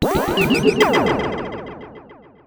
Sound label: oneshot delay hit triple